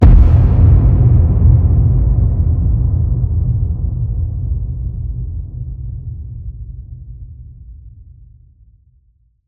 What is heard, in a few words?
HIT Low, Cinematic Hits Designed